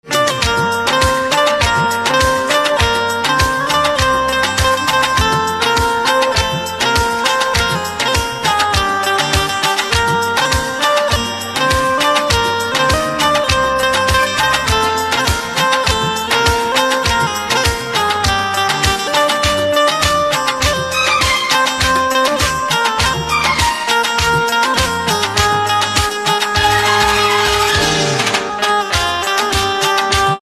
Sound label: Banjo Instrumental Ringtone